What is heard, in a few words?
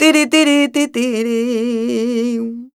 voc a#, Flamenco Female Cantaora; Best Service ProSamples vol.46 - Flamenco; Vocals; Female Cantaora 2